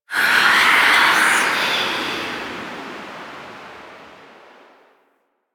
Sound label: Tailor Shop Door Whispers; Warframe; NineteenNinetyNine